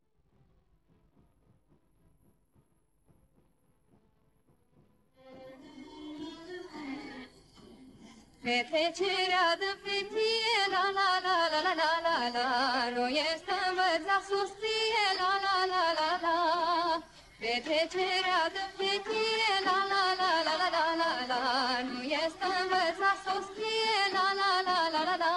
folclor, vocals; TVR TM

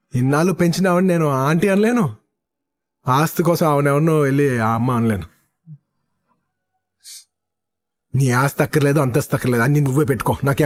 sad vocals

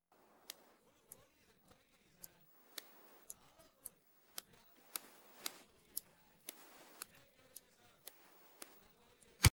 happy no vocals

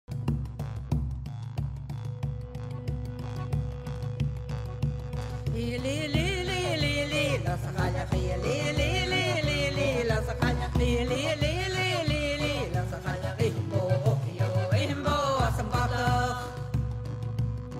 Traditional Celtic Song; lal, lal, ars’ a’chailleach

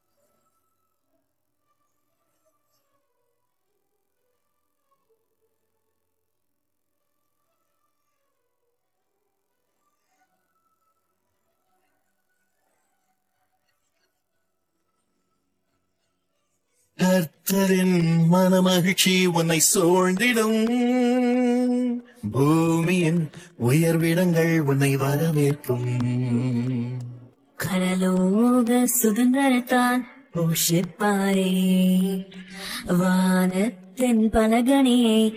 vocals

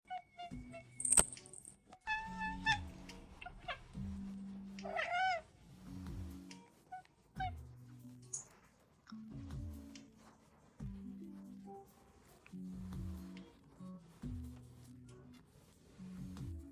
no vocals

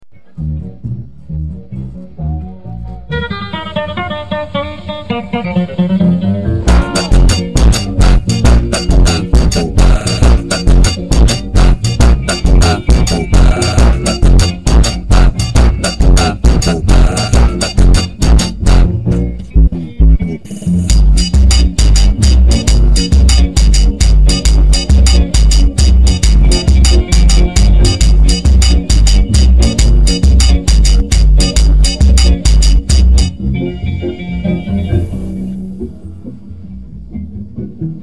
funk no vocals

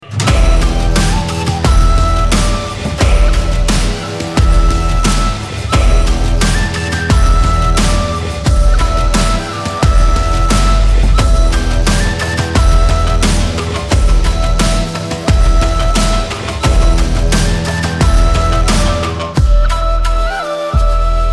minus no vocals

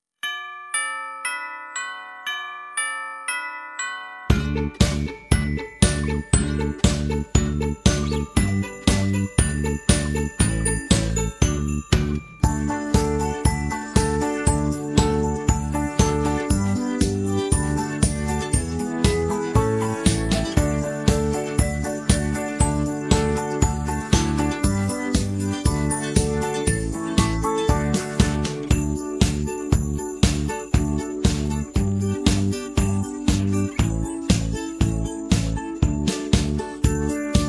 no vocals

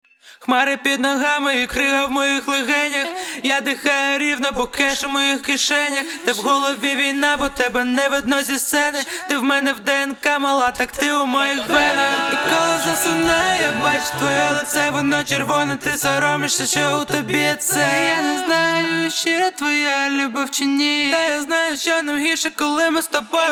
vocals

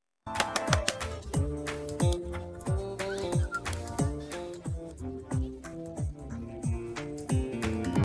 happy no vocals

